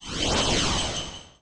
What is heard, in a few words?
bus; coolmathgames; 60 second santa run